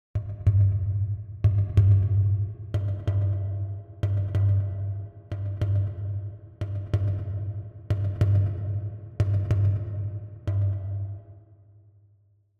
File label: Drums Slow Hits - Heart Beat; Hollywood Edge; sound designer's toolkit v2